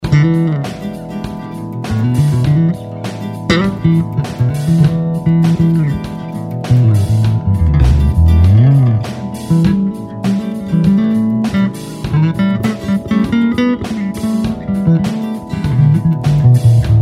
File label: Blues Funk In E 100 Solo Bass; Blues Solos